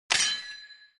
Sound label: Click icon